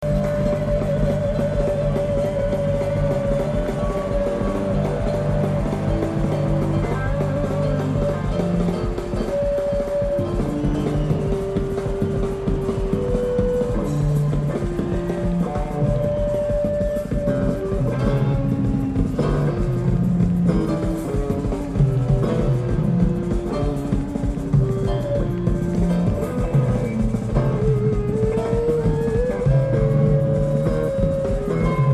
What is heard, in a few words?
Big Ball Jam